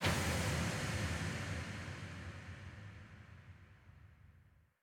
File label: 128 BPM FX Impact; Vengeance - Essential Clubsounds Vol.5; Demo Constr Kits; Sidewalk Kit Root G